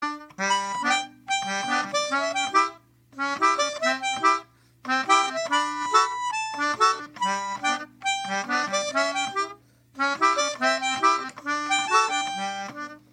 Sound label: melodica